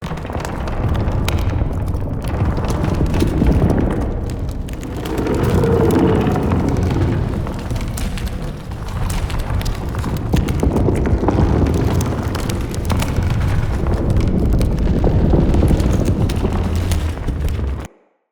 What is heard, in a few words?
Root Cave Living Tunnel Loop, Ambience; Props; Neathuns Root Cave